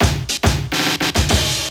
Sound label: Riddim Drum Fill 140